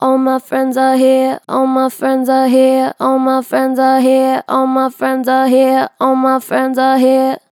Vib All my friends are here, Vocals, Natural Vibrato Strong; Harmor Vocals